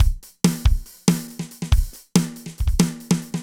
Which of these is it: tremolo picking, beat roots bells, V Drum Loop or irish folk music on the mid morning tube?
V Drum Loop